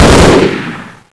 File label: mgun, hgrunt; Half Life Model Pack; Half Life 1; valve hd